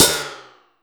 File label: HH OPEN, Fresh Disco House; USB Soundscan vol.20 - Fresh Disco House I